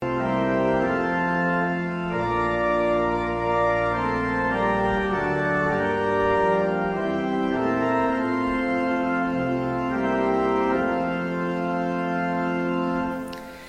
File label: Descant